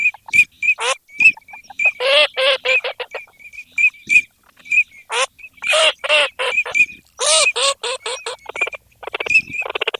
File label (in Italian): ALZAVOLA